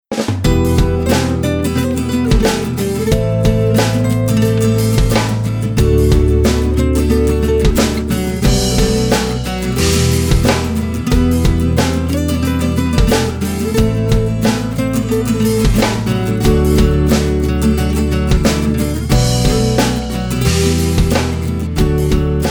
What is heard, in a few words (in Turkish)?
ARANJE AKUSTIK